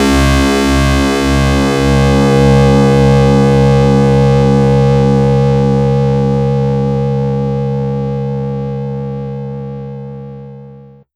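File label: Yamaha DX7 Lead Guitar C2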